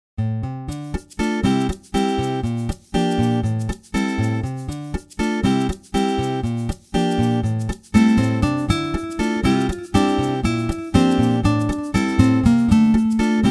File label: Latin Pop, strum acoustic